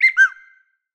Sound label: Funny Whistle